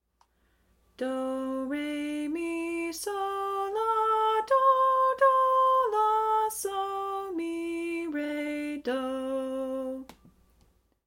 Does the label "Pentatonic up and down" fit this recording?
Yes